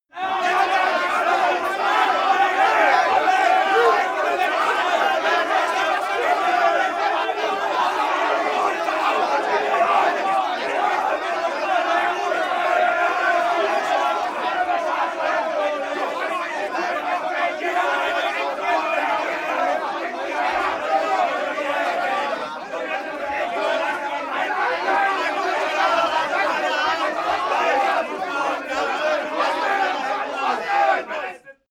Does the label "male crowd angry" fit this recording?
Yes